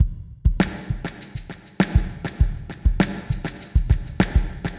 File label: Drum Effect Verb Delay